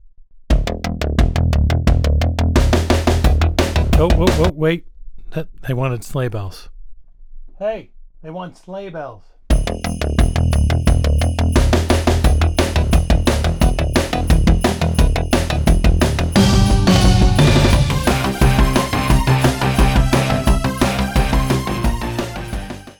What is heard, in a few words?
Street Fighter Bells